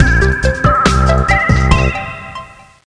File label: Win